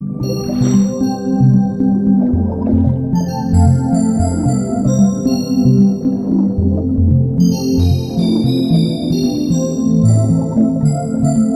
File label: Underwater Theme; Super Mario 3D World